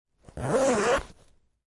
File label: zipper